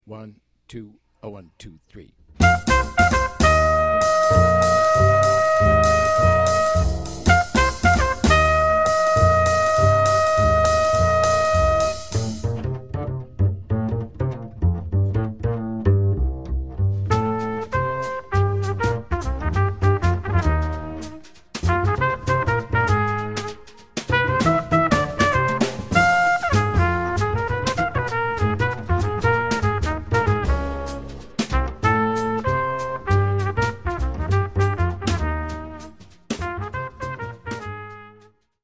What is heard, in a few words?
minus piano